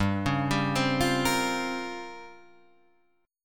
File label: Bb Minor 6th Add 9th, guitar